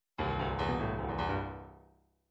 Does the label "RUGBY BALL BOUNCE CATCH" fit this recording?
No